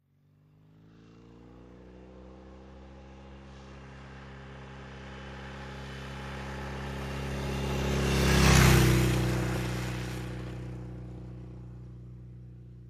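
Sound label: Motorcycle Norton Accelerate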